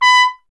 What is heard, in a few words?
TP B; Miroslav Vitous - Solo Instruments VOL-1; Trumpet